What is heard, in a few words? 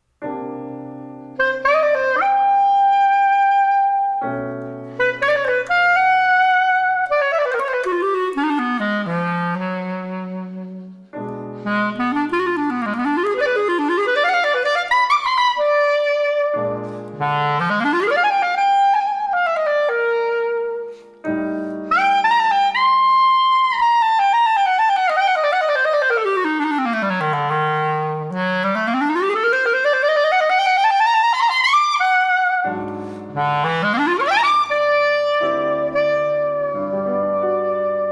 Improvisation